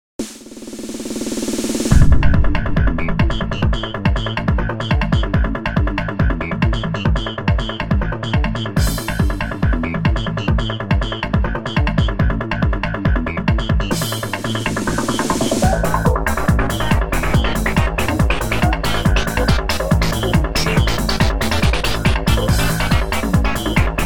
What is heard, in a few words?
red synth